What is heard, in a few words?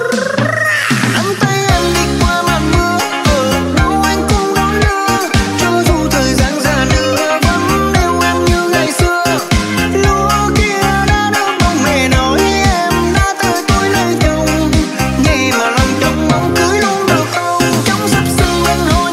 Remix